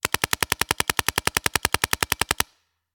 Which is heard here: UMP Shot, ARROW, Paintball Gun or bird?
Paintball Gun